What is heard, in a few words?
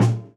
tom hi muff; Tom gedämpft; mattlohkamp